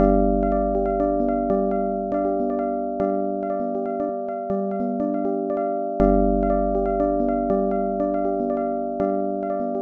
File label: rhythmicon